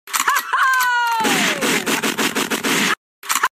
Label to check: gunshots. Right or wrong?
Right